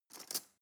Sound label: cutlery teaspoon drawer grab